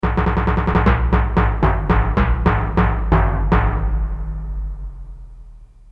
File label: timpani, Roland MT-32